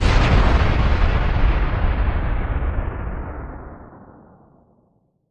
Big Boomer Impact

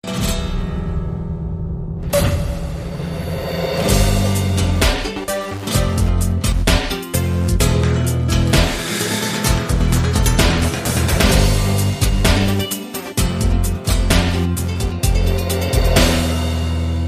Flamenco Tango

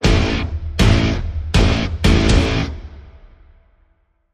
Stab Guitar Phrase (A)

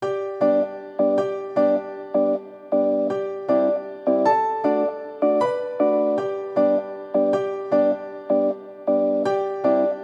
Simple Days Of Joy, No Drums

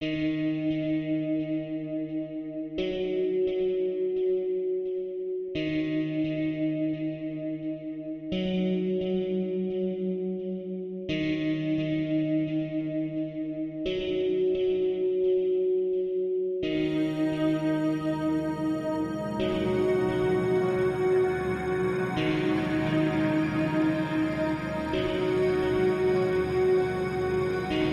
Drone, Light

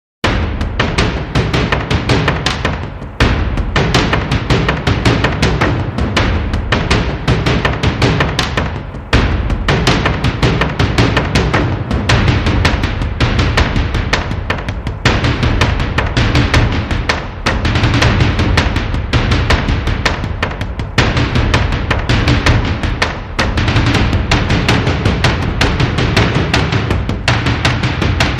Mid Drums

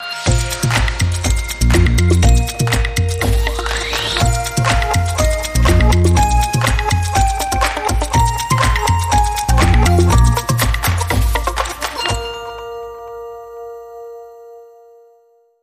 Toddlers Techno

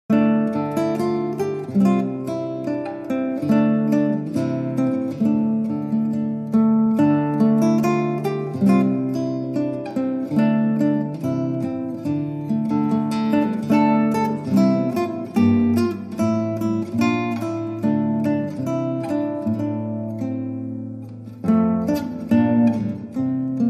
Solo Guitar

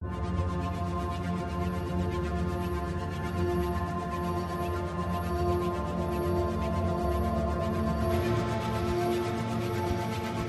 Oratorio Drone Light

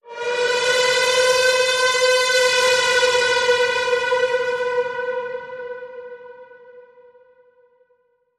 Bend

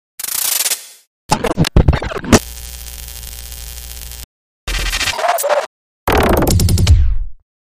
Cosmic Chatter Plugged Marbled Stalled

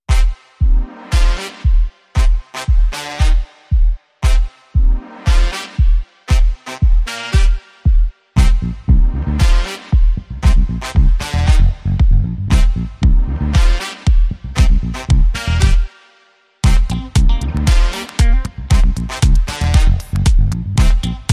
Brass Wire

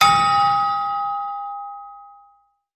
Anvil Strike

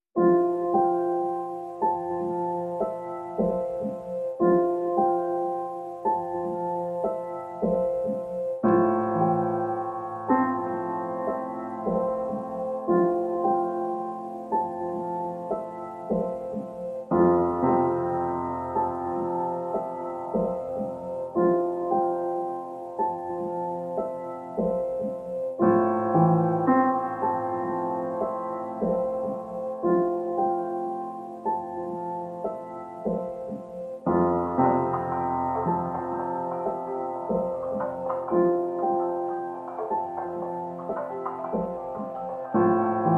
Pianos Only Mix

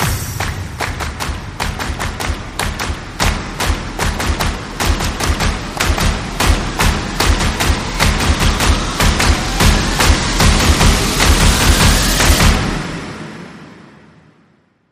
Anthem Cheer Rise

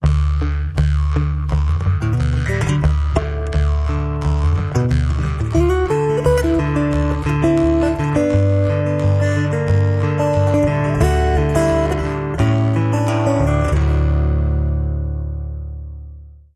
Grampas Old Guitar

